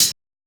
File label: hat